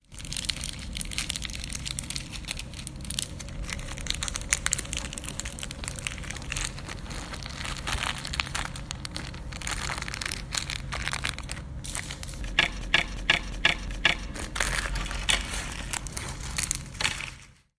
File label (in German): knistern